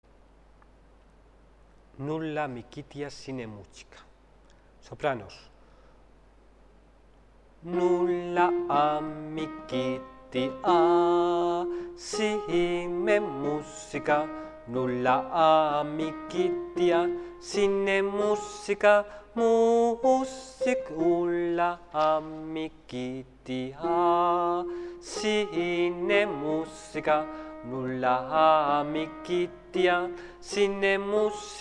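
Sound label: soprano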